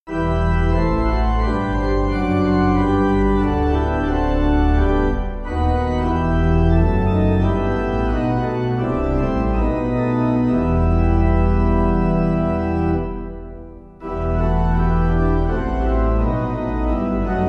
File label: Organ, hymn; Erhalt uns Herr; Hymnal 1982